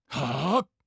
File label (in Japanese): 攻撃